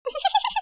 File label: laugh; TamTamMicro.activity